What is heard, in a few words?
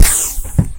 door normal, scifi; scifi nodes